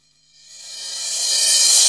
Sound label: Cymbals Reverse Crash; Vengeance Samples